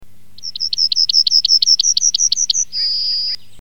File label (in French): bruant j